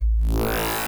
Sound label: barkskin off